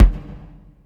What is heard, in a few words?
AMB KICK, ACOUST KICKS; Greytsounds